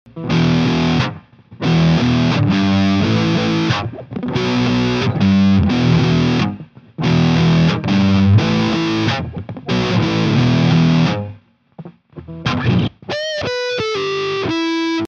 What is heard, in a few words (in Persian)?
Rock and roll, موسیقی راک اند رول بی کلام